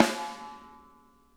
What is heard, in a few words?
snare, drum kits